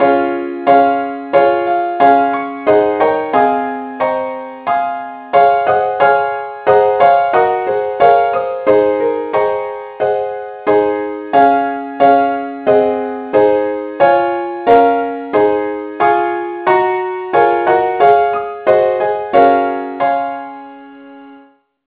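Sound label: key c sharp; saintthomas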